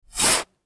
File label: Shovel Scoop Dirt Dig; Yard Tools (Sound Effects:Tools)